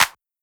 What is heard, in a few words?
clap wide, Hip-Hop; Drum One Shots; SD CAICOS Hip-Hop Sample Pack; Slate